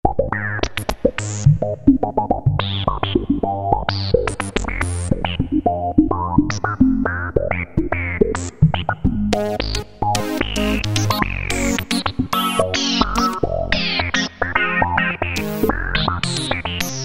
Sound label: Synth Sweep, Roland Alpha Juno-2; Factory B